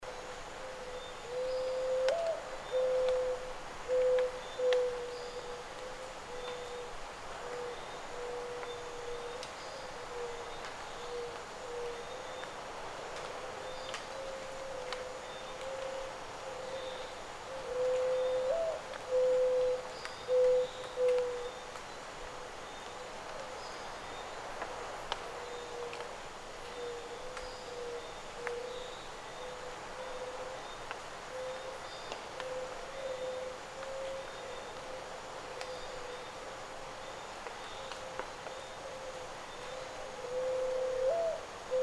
zenaida doves; کبوتر زینایدا

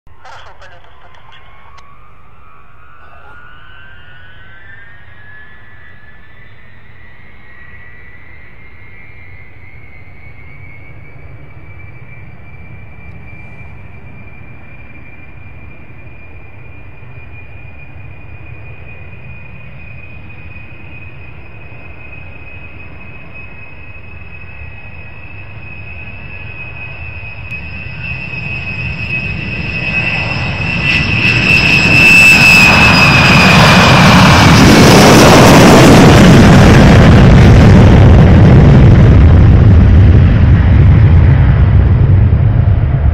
Tupolev Tu-154; توپولوف ۱۵۴

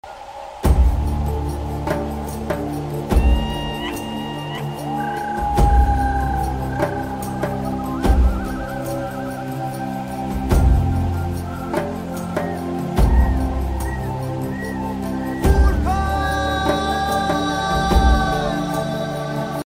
Buddhist flute; فلوت بودایی

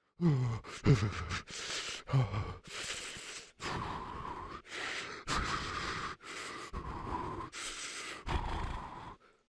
Shivering from cold; لرزیدن انسان از سرما

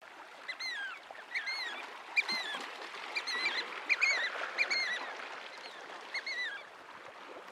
Sooty oystercatcher

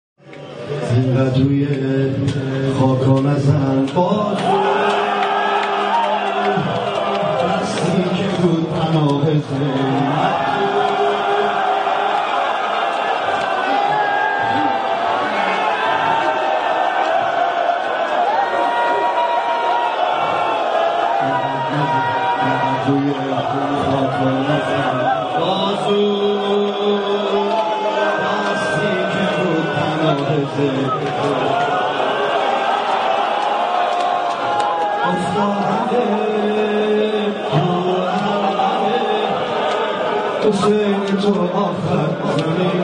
mourning cry

